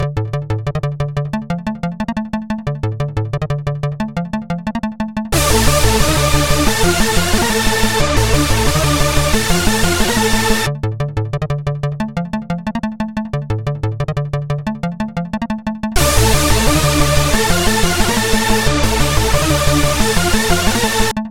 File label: Rotterdam Techno Loop